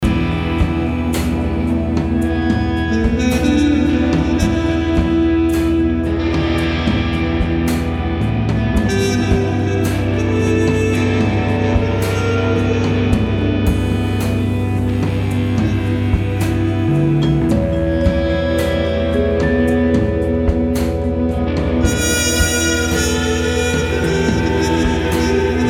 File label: Feedback Soul overdrive